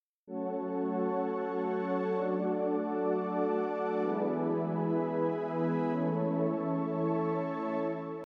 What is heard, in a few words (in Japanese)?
デモ曲（パッド）